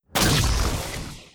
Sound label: shot